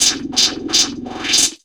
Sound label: filter roll; Zero-G; Total Drum Bass; Hits and Bits